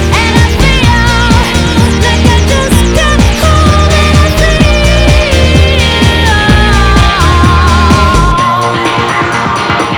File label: pop FHSS